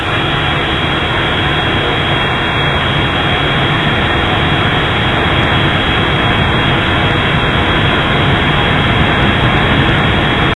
jet, plane

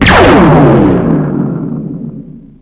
farsight fire

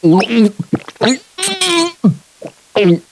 drown

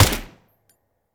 mp5 shoot end, weapons

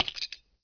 npc gear

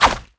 jump attack whoosh

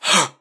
Swing; doctor

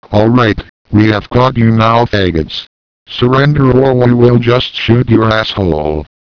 grunt

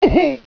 pain; terrorist

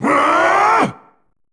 scream; gogeta

